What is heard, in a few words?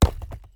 Panel Sort Sample Drop; Among Us